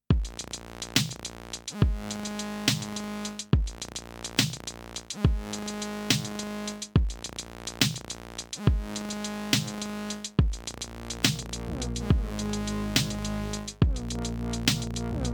own beat

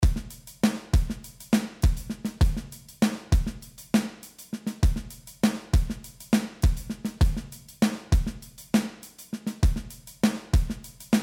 MW LP Cutoff Reso

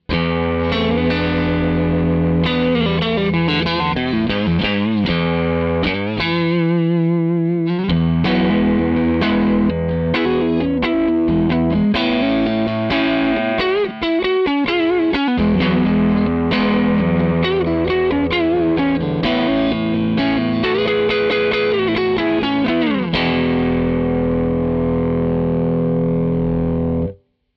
Bassman d comp

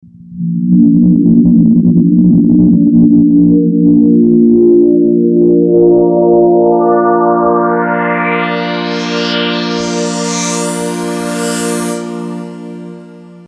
Alesis Fusion A Pad

